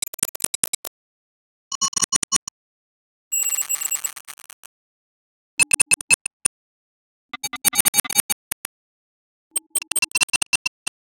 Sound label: Texture Glitch Variations; MatiasMacSD; Minacious